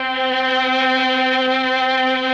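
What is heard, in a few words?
MELLOSTR, Mellotron Violins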